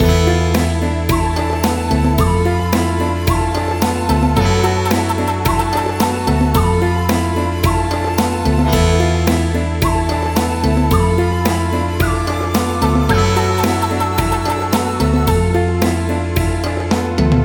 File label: Asian Game Theme Loop